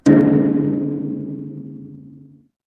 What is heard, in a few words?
instrument gong